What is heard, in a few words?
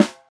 dope acoustic snare g sharp key; snare drum samples